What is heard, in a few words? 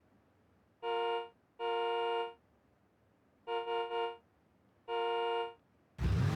Dacia honk